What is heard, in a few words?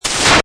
Scifi Production Element Hard Scratch; Scifi Production Element - Hard Scratch. Suitable as science fiction film and game production; Science Fiction Sound Effects; Lynne Music